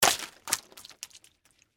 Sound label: Water Balloon Drop, No Break; Balloons; Cartoons